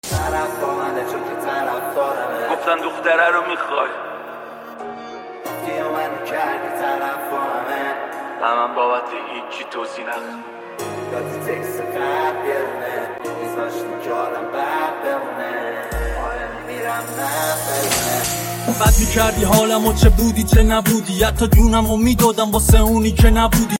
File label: remix